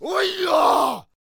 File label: Berserker Attack Vocals